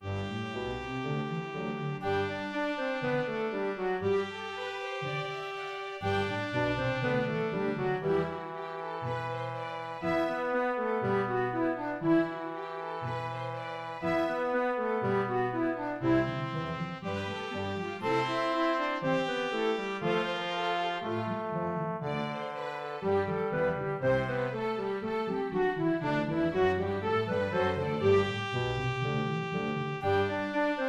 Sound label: Brass